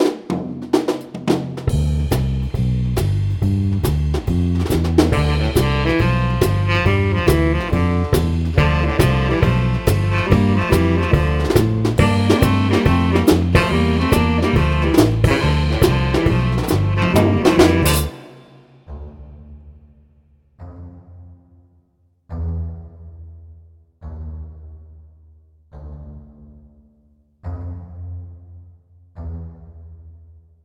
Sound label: swing violoncello; policajti